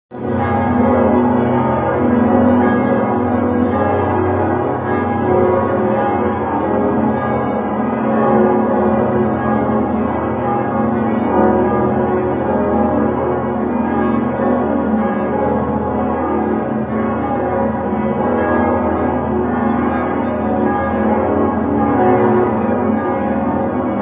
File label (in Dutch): Domtoren klokken